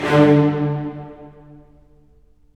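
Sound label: STR, strings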